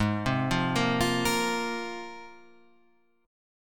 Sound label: Ab 6th Add 9th Ab; arpeggiated; JGuitar